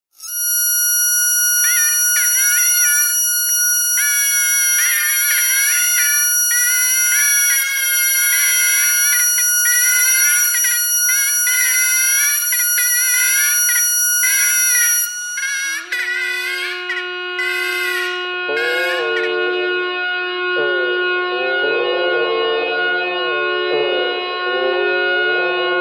bagpipe radio bagpipe thread noise

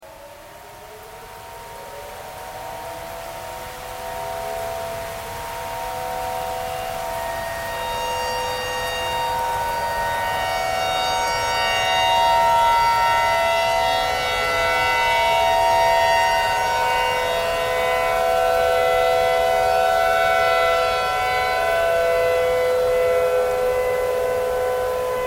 tornado siren

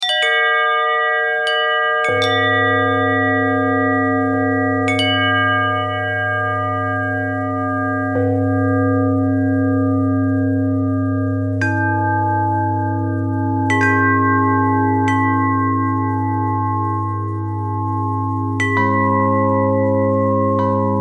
bell thread

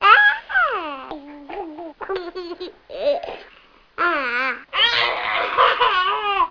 baby gurgle; Sound FX; Sound FX - Jeers

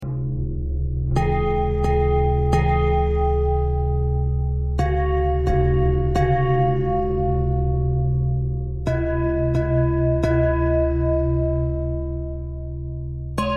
bell thread pentatonic

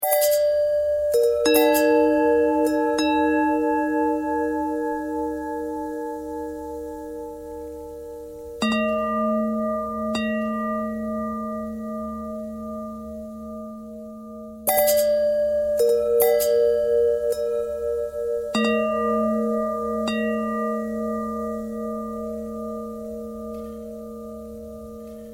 bell longbell thread bendthread

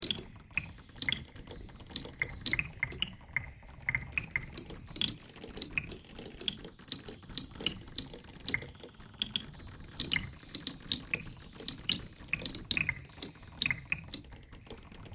bagpipe thread noise; bagpipeclick

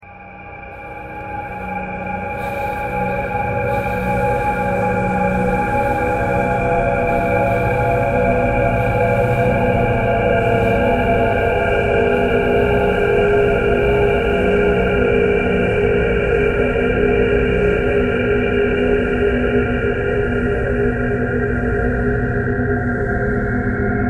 traffic core birds thread harmonic

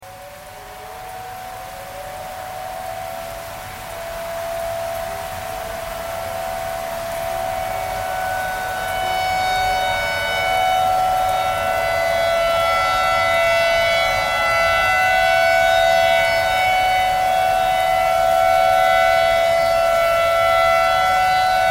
tornado siren tornado siren thread pentatonic

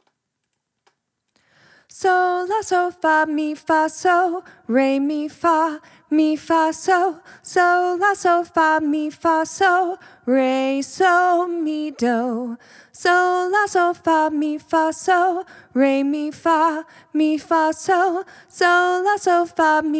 london bridge solfege

